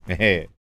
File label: LAUGHS, Jazz FX, Vocal Planet